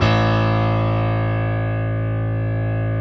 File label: Piano A0; Proteus 2000; Multisamples; Digital Sound Factory Proteus 2000